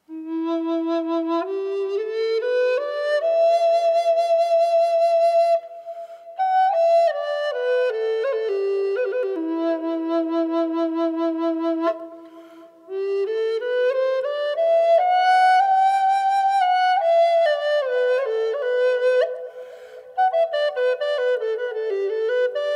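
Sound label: Port Orford E minor Wet, cedar flutes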